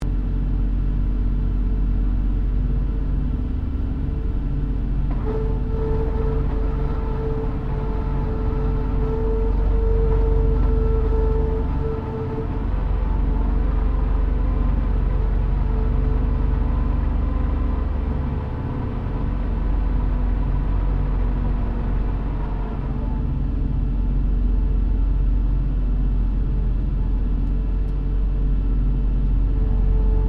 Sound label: AIRCRAFT LOCKHEED L-1049 SUPER CONSELLATION APPROACH INTERIOR; Connie, Super Constellation; 1955; Airplanes